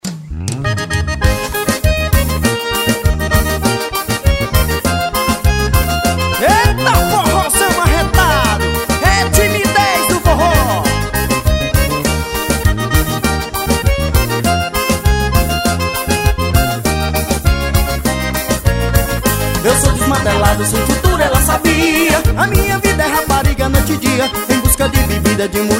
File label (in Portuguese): forró